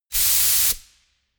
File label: pneumatic pump long